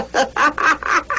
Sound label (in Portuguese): risada; chaves